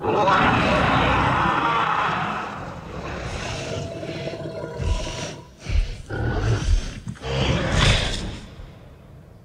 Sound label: Dino Sounds ALLOSAURUS